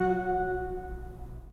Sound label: 3D Pipe Mix Celeste, 3D Organs